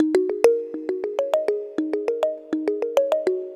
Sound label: Tongue Mallet; Melodic; Ambient-Melodics-II